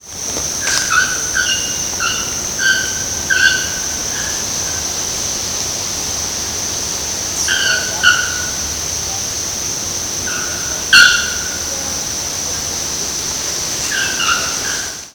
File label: Procnias nudicollis; PE Cantareira SP BR